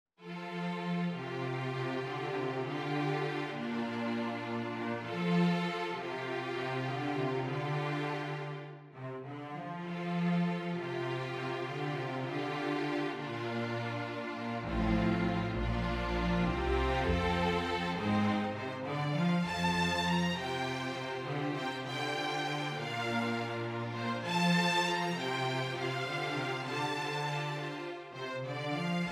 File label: for String Orchestra